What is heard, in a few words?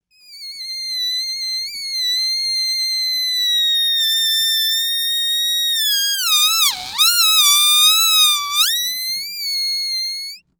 Cartoon Balloon Sounds Balloon Releasing Air Slow And Squeaky